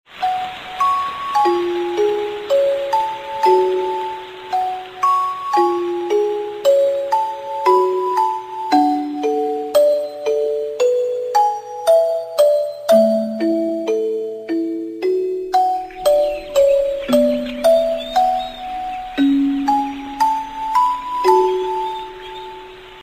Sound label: music box ringtone; elfen lied